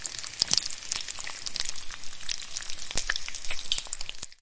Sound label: bacon frying